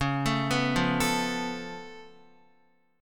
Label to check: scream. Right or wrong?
Wrong